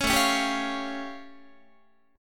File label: A Augmented 7th A Guitar; strummed; JGuitar